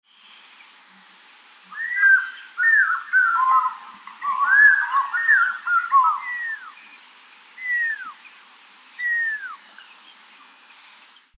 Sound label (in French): pygargue vocifere, chant